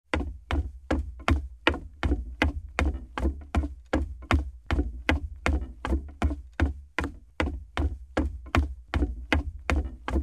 Running on a wooden floor in shoes; steps

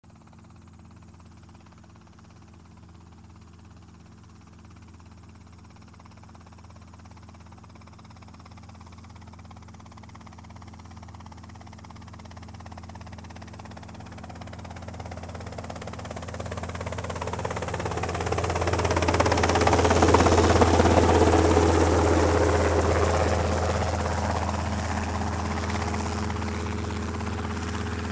Helicopter flies slowly

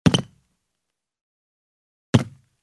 Jump on the wooden floor with shoes; steps

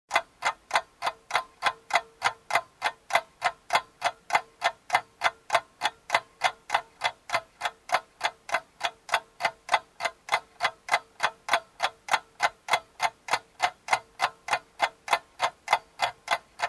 ticking of a mechanical clock; watches

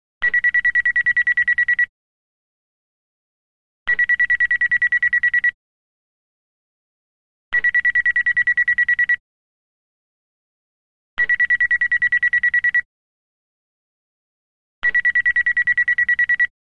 Phone ring; telephone

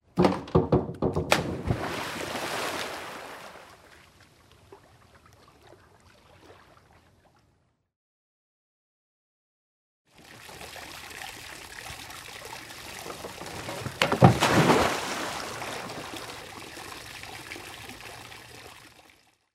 Springboard diving

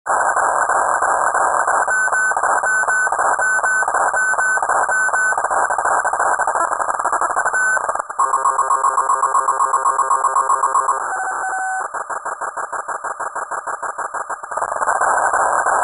Electronic signal

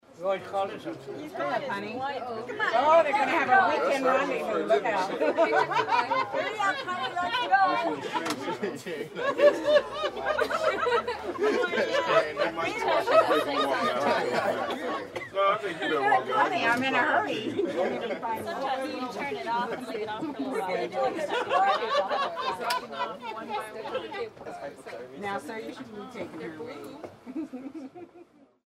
Conversations, laughter, small crowds

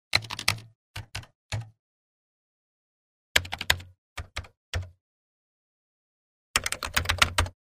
Computer keyboard

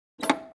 Telephone receiver is picked up, hung up

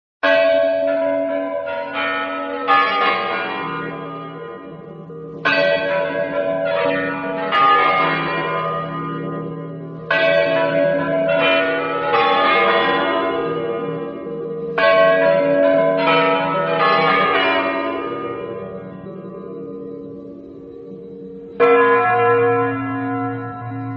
Kremlin chimes; watches

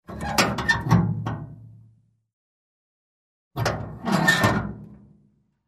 Opening and closing the chimney; fire

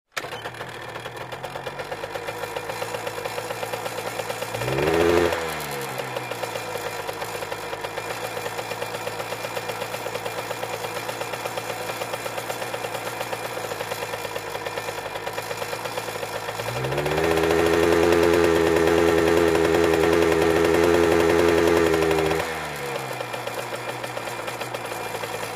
Motorcycle starts, regassing, jamming

